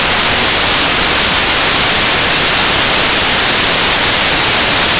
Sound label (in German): weisses rauschen